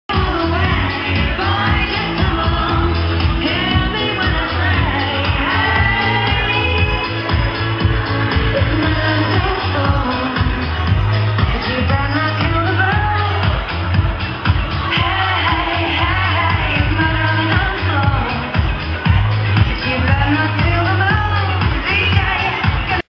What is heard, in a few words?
dance song